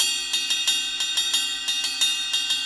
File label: Ride Riff, cymbals; Sonic Foundry (Sony Creative Software); Joe Vitale Latin Percussion